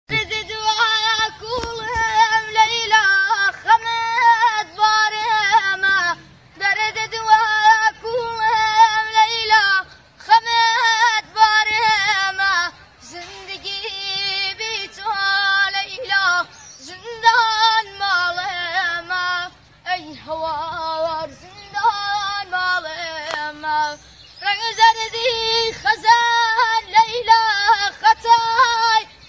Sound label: kordi ba gitar; kordi ghmgin